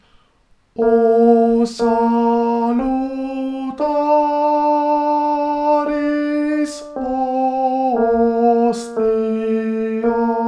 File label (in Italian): Tenori; O SALUTARIS HOSTIA